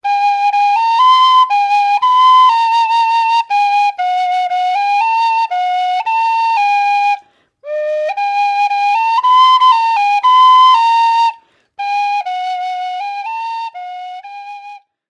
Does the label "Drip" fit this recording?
No